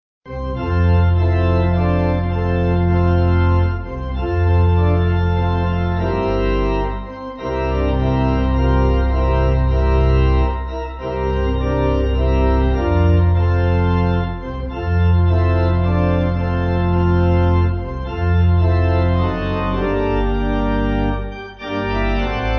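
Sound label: Pipe Organ, Music Backing; The Lord Is My Shepherd; Koschat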